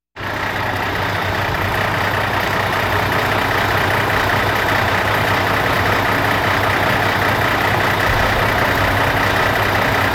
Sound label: Walking Machinery Trucks